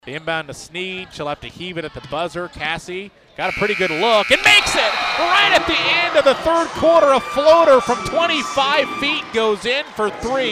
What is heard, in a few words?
Three-Pointer Buzzer; BHS Girls